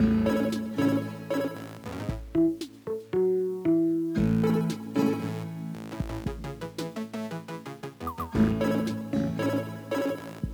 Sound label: 8bit; Vlad & Niki 12 Locks; Android